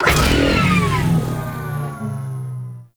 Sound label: Hoverboard Boost Slow Stop; Warframe; Vehicles; Venus